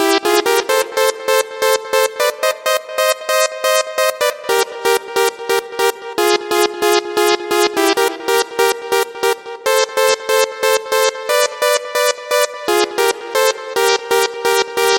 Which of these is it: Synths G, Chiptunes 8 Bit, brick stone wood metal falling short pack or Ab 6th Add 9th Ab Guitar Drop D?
Chiptunes 8 Bit